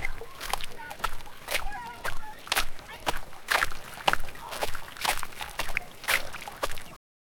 a man is casually walking, water surface

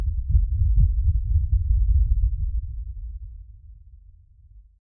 a dramatic cinematic, heartbeat

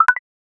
shot sound plays in game, melodic

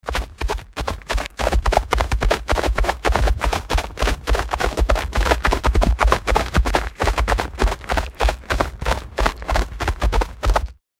the sound of running on, footsounds

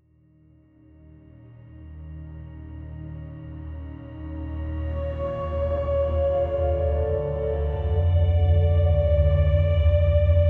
dreamlike ambient score, taiko drums

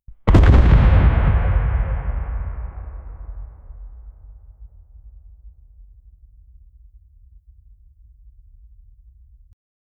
outdoor gun explosion, reverberant

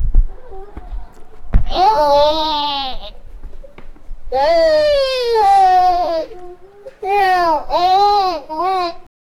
two boy lounging each oth, fight

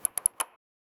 short sound for a game

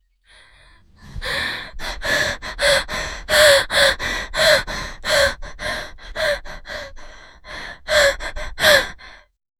a woman breathing heavily; fear

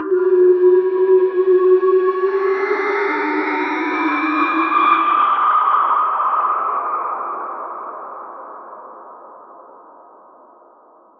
drift, bodily functions

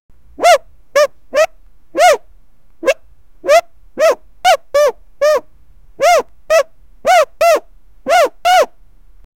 sound pat pat pat hehe he

